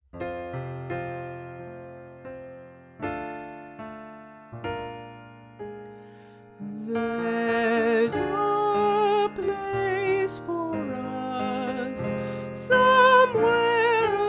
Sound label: Soprano, piano